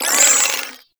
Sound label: Flare Impact; Warframe; Sentients; Sentient Trooper